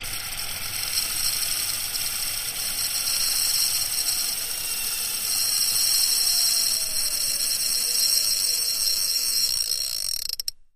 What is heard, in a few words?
Machine Film Synchr, variable speed